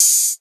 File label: Open Hihat; Best Service; House Definition VOL-1; Cymbal Stuff